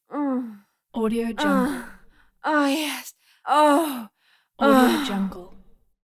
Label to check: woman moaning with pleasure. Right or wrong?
Right